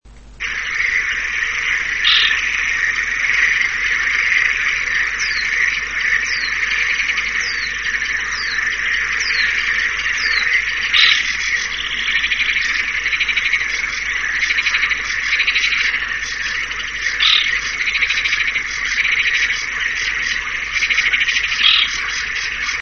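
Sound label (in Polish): karliczka, chrusciele rallidae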